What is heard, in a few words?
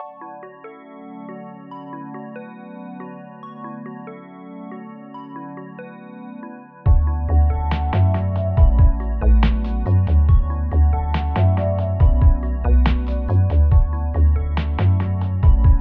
Happy Chill Lo fi Beat